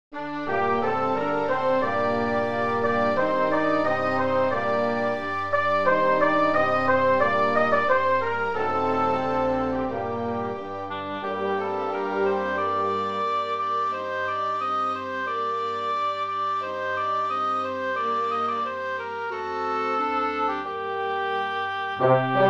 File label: Old French Song orch